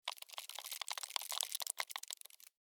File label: Insect Walk Move, Insect, Walk, Movement, Bug; Animals:Insects; Blastwave